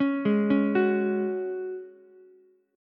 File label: F sharp Suspended 2nd, Ukulele; arpeggiated; JGuitar